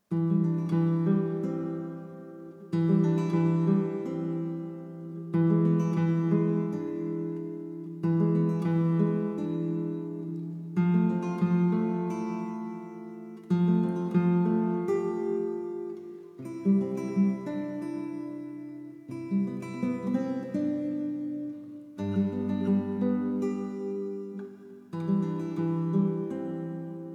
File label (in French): Decembre guitare